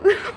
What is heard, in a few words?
hit vamp